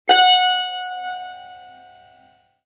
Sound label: tar, "sol" b